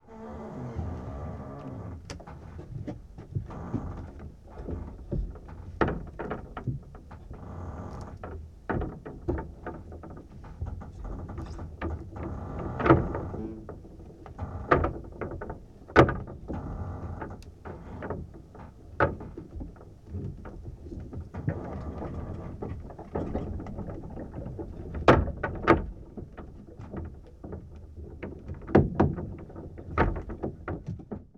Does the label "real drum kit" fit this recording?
No